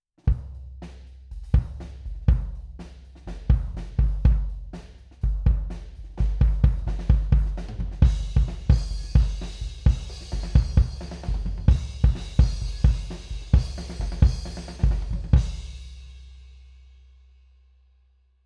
kick head on outside d112

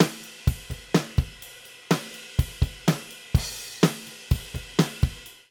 drums balance comp rev

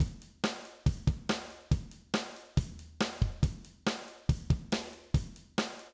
verse snare gated room

